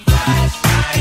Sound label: flashlight